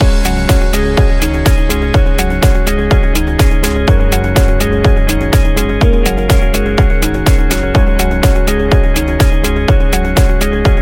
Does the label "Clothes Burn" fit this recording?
No